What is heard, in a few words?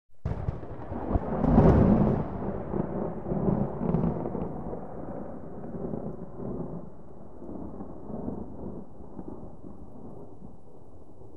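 Thunder Explosive, distant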